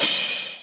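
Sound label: cymbal short; osu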